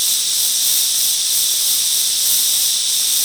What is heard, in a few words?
pipe steam; WAR